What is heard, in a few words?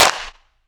cringle, Clap n Snap, Snares